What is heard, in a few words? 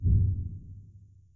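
Ui Menu Whoosh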